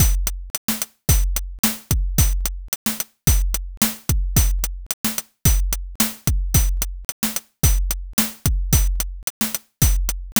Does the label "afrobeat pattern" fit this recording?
Yes